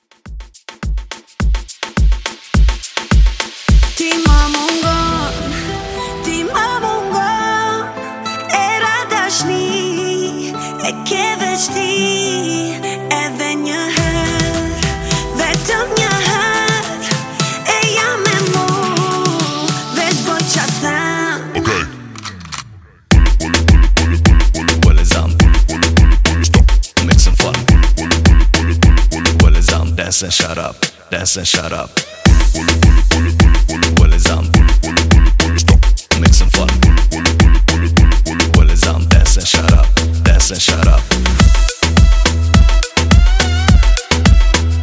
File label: DanceHall Mixshow 105 Bpm